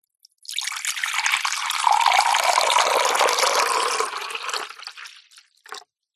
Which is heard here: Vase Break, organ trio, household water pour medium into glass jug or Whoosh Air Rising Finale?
household water pour medium into glass jug